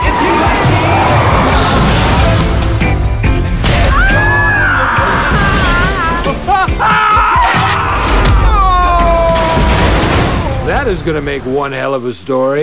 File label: bed bomb; cstrike; krazzy sounds